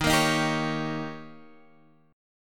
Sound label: D sharp 7th D sharp, Guitar Half Step Down